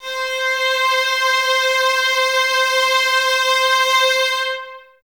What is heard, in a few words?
violas C 5, String Ensembles, Exprsive; Miroslav Vitous